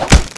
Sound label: knife hit, Fists; cstrike; JAIL